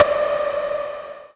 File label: Synths D; Vengeance Samples